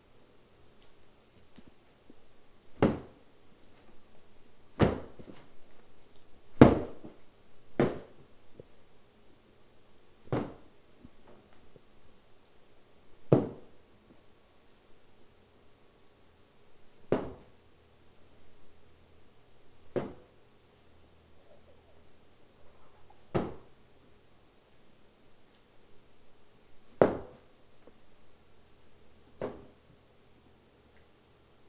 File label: Shoving Punching Beat Up